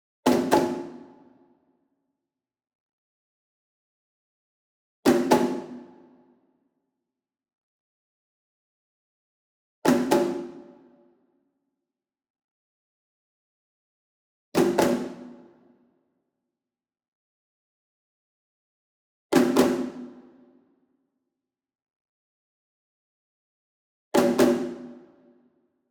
ENSEMBLE DRUM Shime Daiko And Chinese Tom Double Medium; boom library; Cinematic Strikes Construction Kit